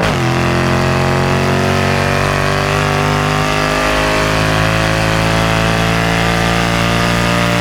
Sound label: third, vehicles cars; lwcars; mer c63 amg